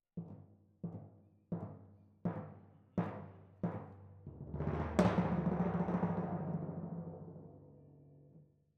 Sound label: Timp Close